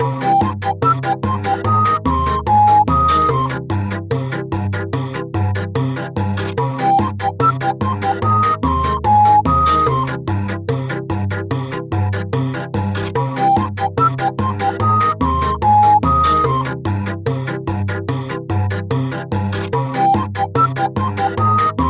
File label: happy ringtone